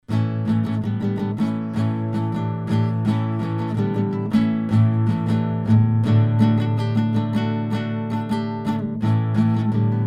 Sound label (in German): tbone sct 700 gitarre